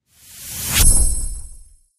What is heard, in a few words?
substitution symbol enter; shangrila